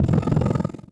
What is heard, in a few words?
Dragon Snore